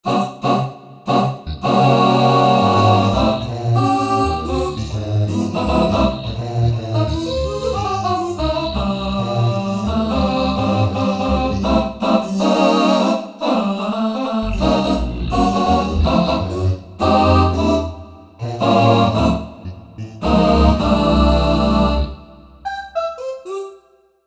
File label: Voice, synth